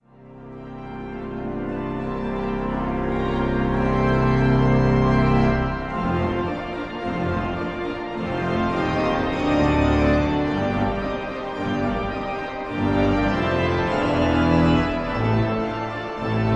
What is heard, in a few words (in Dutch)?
orgelklanken; Bekende orgelklanken